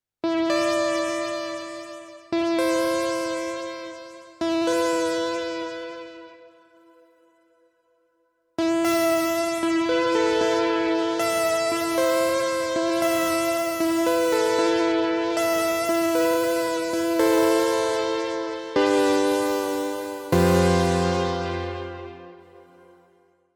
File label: Arturia V Collection X Mini V4; Mini Frequent Sea